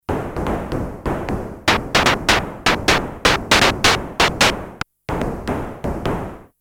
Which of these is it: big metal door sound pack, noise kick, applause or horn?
noise kick